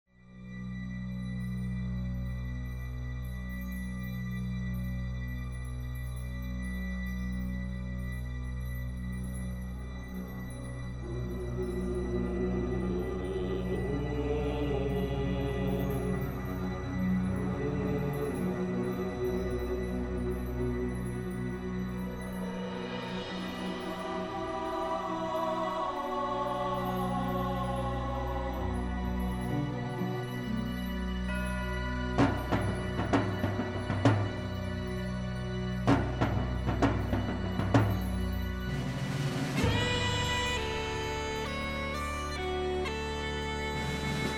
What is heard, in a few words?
Music; Age of Empires III; cinematics